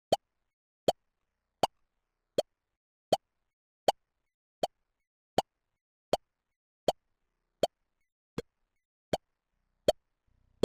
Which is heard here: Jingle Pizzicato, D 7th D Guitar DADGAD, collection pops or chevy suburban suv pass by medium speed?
collection pops